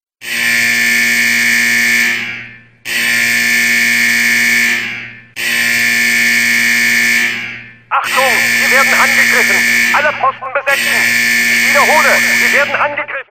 german alarm ringtone; call of duty

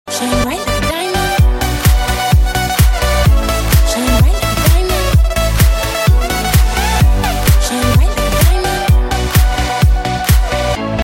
remix

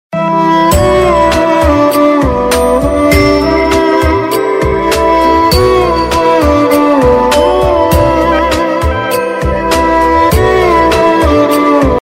instrumental ringtone

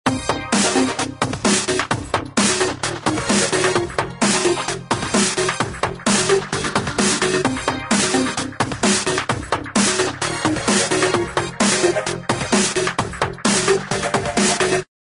htc classic ringtone

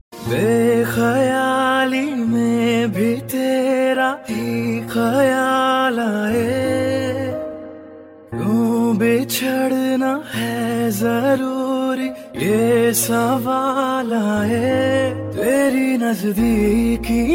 bollywood ringtone

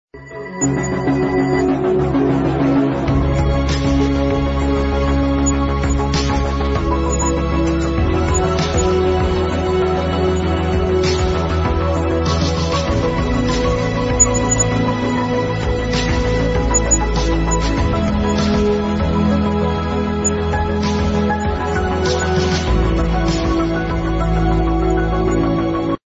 ringtone galaxy s8 edge

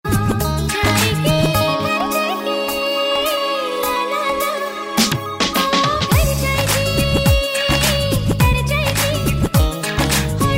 sweet sing tone for mobile ringtone

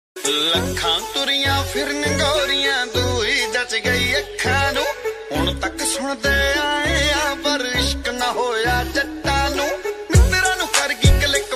punjabi ringtone

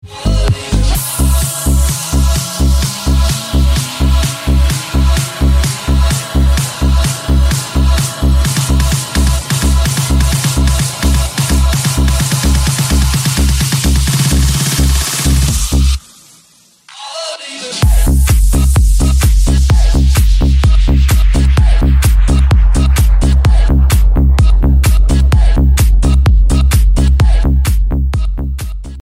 remix ringtone

